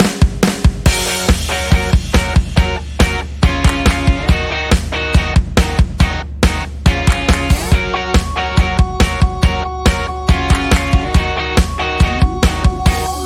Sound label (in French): Musique